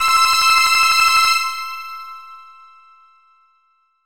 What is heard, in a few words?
Energy SFX; Megaman